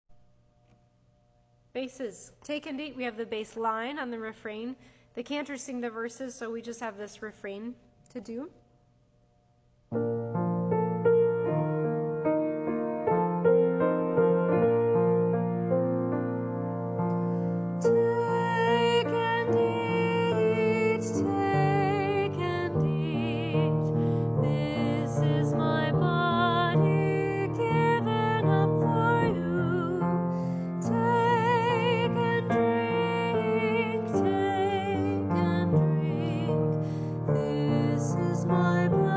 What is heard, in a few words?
Take and Eat Men